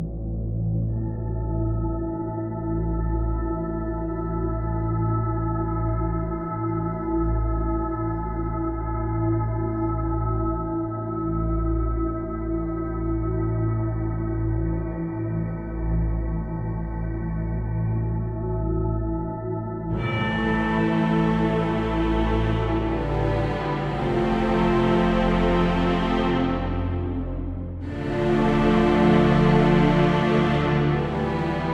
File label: Siberia Church; serious sam siberian mayhem 2022 pc gamerip